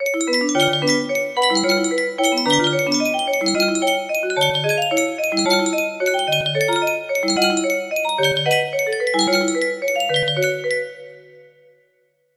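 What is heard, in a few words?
music box melody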